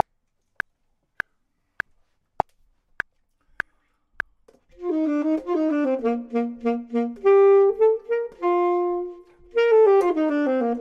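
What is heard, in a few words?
top, Saxophone and Clarinet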